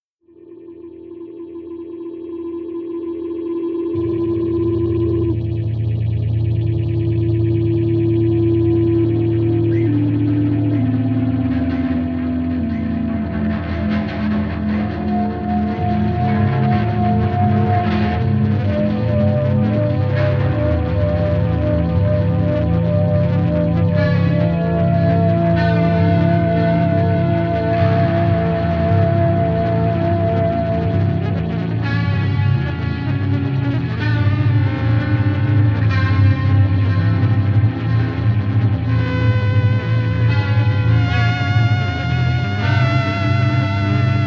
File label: Sad Little Organ